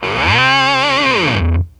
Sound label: MID FUZZ, Funk Gtr & Wah; E-MU Producer Series Vol. 8 – Platinum Phatt